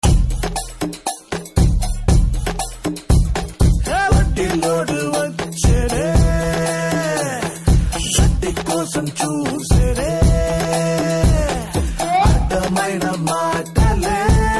Telugu Ringtone

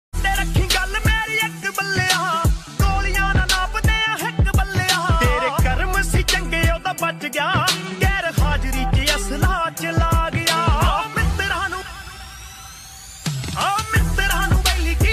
Dance Punjabi Ringtone

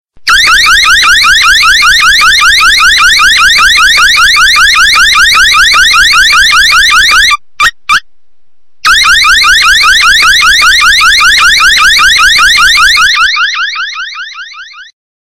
Shop Alarm Siren Ringtone

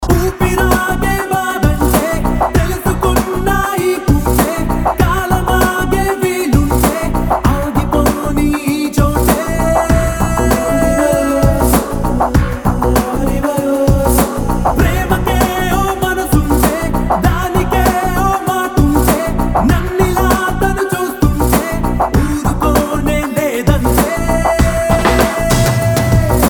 Telugu Ringtone; Brindavanam

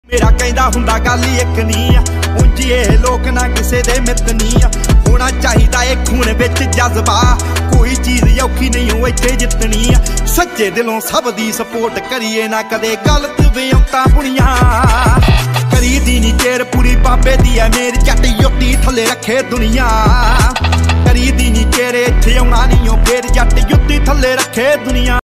Popular Punjabi Ringtone

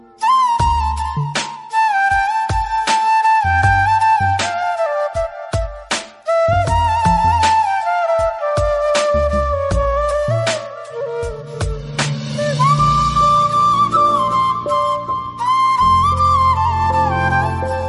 Flute Love Tamil Ringtone

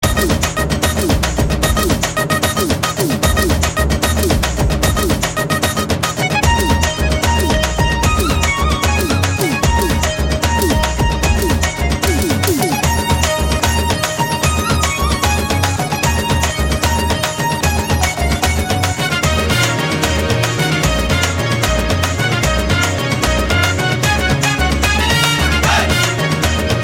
BGM Telugu Ringtone

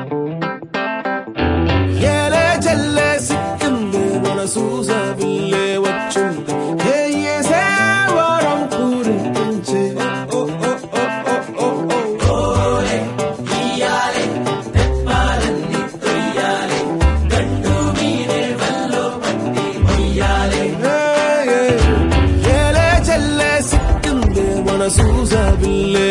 Telugu Ringtone

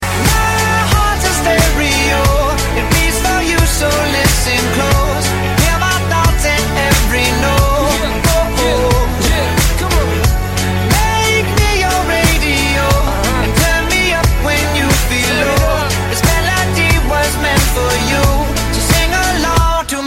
Instrumental Ringtone

